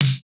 no effect j city pop 1980 snare

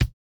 no effect j city pop 1980 kick

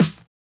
Jazz hop snare; Hiphop

